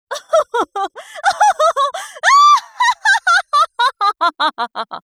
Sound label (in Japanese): takawarai